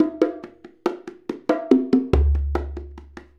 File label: Bonga; Drums; Bongos; Essential Percussion